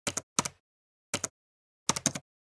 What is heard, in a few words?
Laptop keyboard typing slow